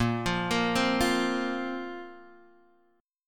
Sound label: A sharp Suspended 2nd A sharp down Guitar Half Step Down